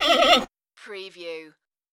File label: creature talk